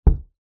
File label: household stone bung jar down on wooden floor; sharpe brothers